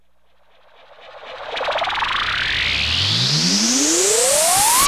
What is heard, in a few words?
falling, Swish